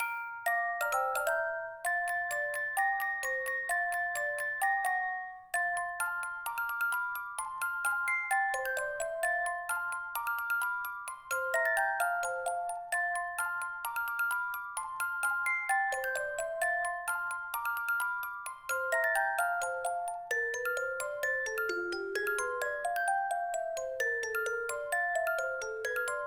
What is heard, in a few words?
Snow Night; SMM2; SMW